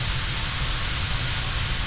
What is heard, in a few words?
Radiance Final Bleeding Light Loop